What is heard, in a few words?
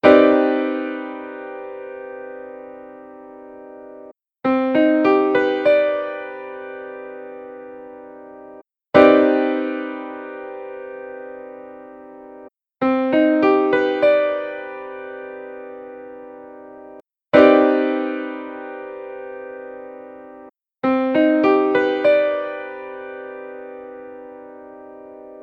Cmmaj9 Piano Chord